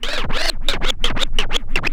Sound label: SCRATCH, Vinyl Scratches